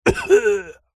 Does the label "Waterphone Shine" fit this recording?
No